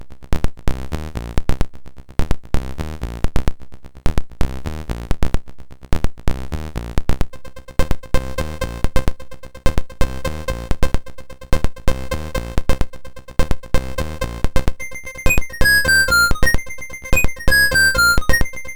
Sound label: The Beat MOS6581R3